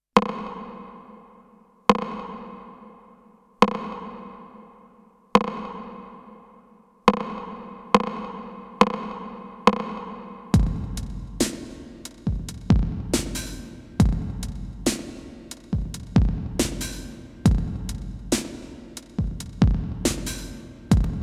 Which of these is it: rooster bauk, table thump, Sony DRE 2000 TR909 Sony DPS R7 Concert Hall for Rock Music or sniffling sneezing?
Sony DRE 2000 TR909 Sony DPS R7 Concert Hall for Rock Music